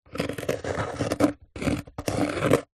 office pen biro draw shape on thin wood

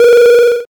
multimedia retro game ping

